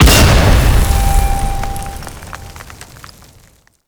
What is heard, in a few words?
Heavy Slam Ability Slam; Warframe; Duviri; Dax Heavy; Abilities